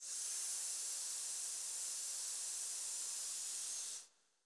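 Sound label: choir SSS, Voiceless; Sound & Vision; Gigapack I CD 2; CHO Vibr.+NoVoic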